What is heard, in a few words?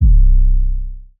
808 - C; Cymatics; Eternity Sample Pack